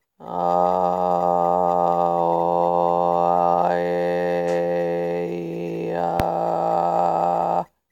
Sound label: Throat singing; practicing